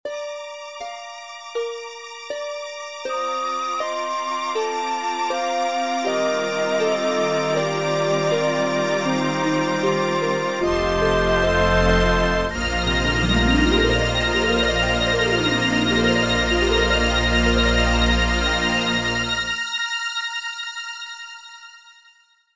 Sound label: Jingle Sleep; Elin